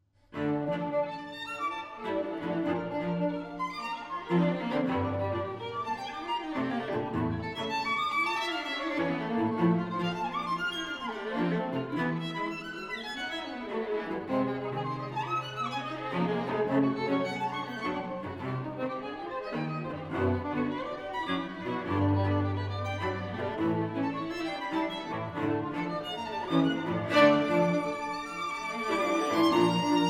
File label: String Quartet; Edward Cowie; 'Birdsong Bagatelles'; Kingfisher